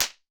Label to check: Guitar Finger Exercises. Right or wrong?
Wrong